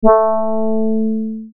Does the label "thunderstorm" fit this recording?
No